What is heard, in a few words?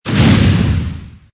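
explosion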